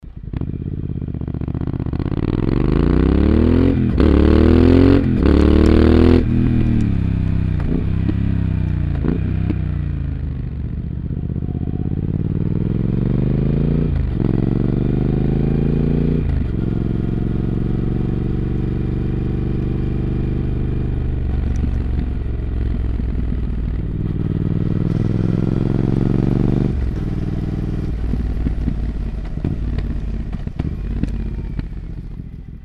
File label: Triumph Speed Twin Slip On; REMUS